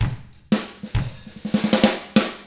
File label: 95 FUNK, Drums; ILIO; Double Platinum Drums 1